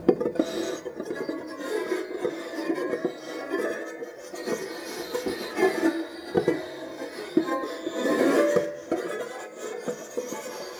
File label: Tap Rub Erratic, Piano; Piano Miscellaneous